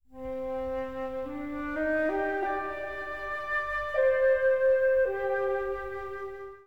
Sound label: flute, Sad